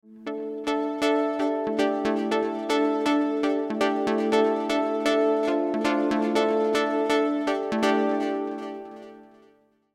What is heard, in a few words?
jet reverb dly